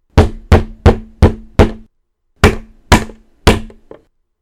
Door Knock Angry

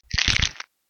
Bone Crunch Fast

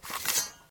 Quick Sword Draw

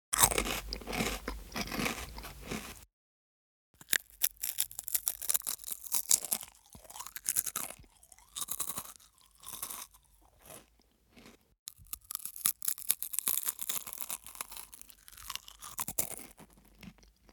Chewing A Cracker

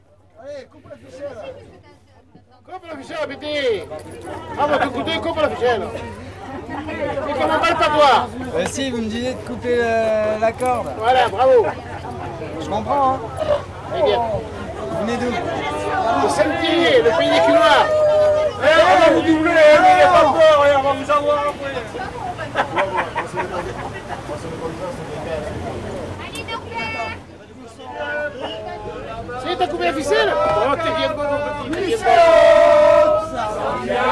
Boat singing

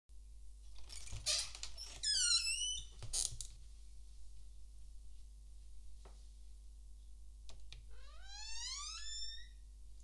Creaky Door

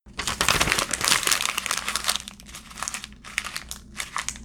Paper Crumbled

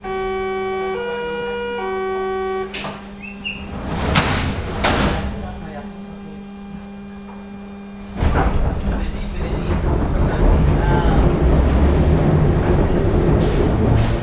Berlin Public Transport

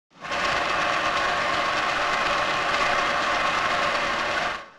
Industrial

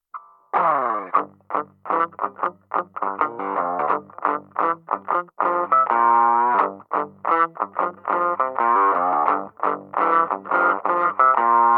G Blues Radio

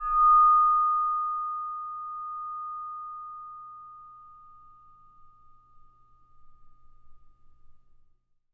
High Frequency Ring

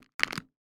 Bone Crunch Crack

